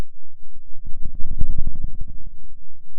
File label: pop, Sound of a dinosaur